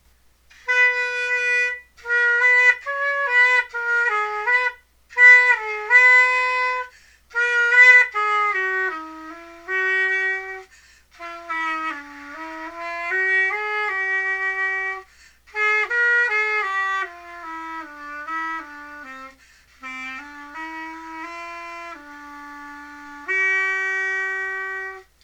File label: sax